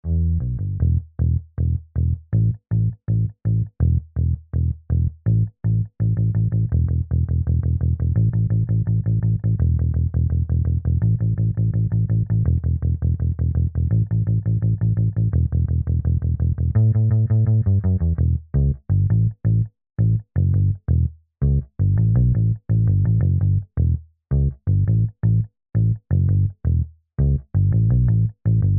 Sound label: Bass bypassed; karacterised